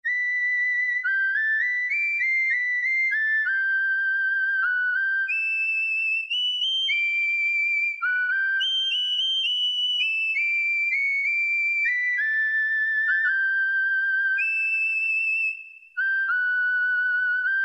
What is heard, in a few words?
Solo; Cheetah MS6